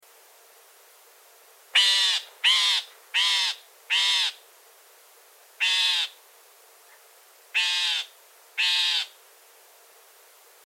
Loggerhead Shrike, Lanius ludovicianus

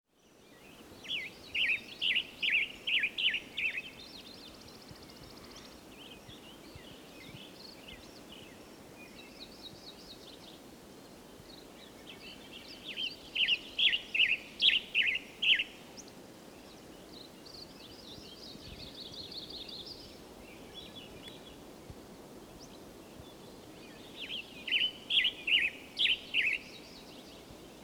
Western Tanager, Piranga ludoviciana; cardinals tanagers grosbeaks

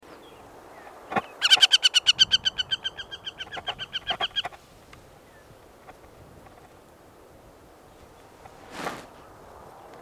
Northern Harrier, Circus hudsonius; raptors

